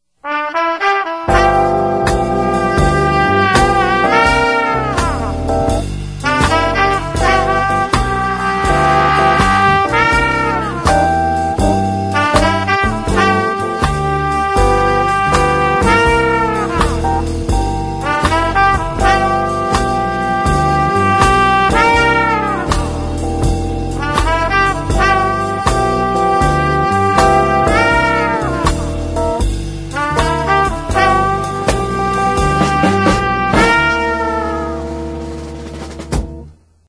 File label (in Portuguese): Musica de Striper; Vinhetas